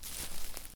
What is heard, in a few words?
grass, footstep